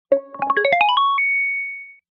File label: start